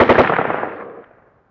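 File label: gun ak47 distant; Call of Duty Modern Warfare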